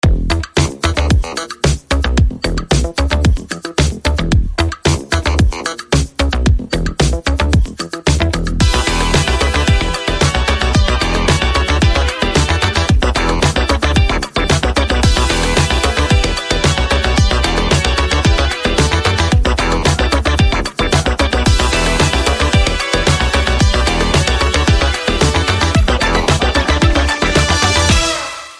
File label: Boom Shake Boom